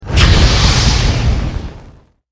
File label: soul blaze cast